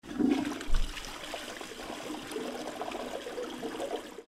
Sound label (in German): klo